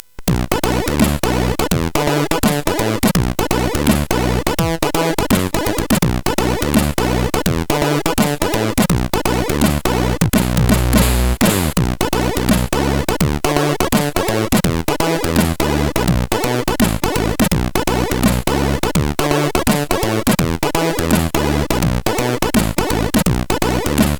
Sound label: Funk Bass, CSG8580R5